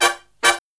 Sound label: Brass Riffs; USB Soundscan vol.29; AKAI